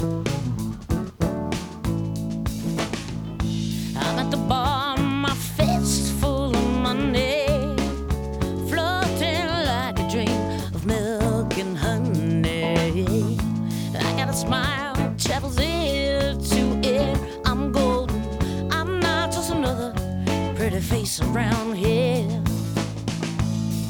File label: vocal no delay; audio examples from the book